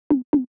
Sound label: Drum Loop 133 BPM Tom; Drum Loops; Loop Stems; Cymatics; Mystery Pack Vol 4; Enemies Drum Loop